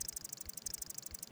advanced growl short

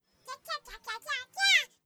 dance ant

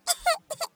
sad ant

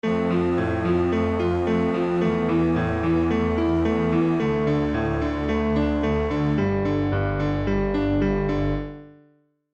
sad piano

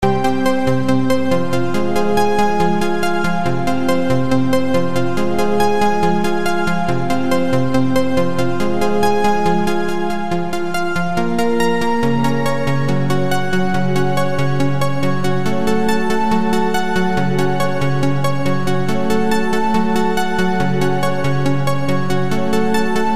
cave remix

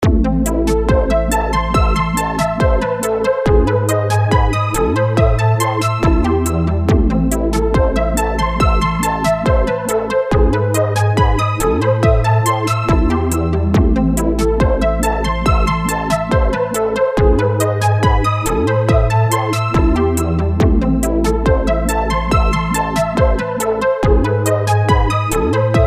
evil boss

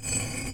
flip

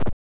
BUMP SOFT; Airport Runer

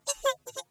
sad fly

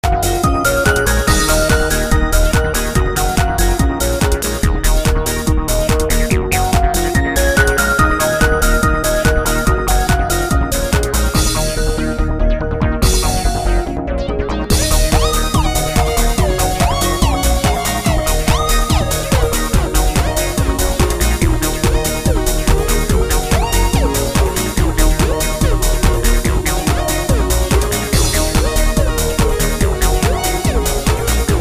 mystery

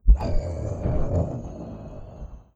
explode

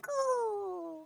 cool high

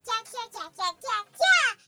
dance cat

